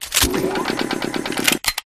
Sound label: smg reload, guns